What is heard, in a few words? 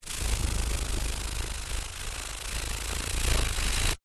animals insects moth wings flap fly close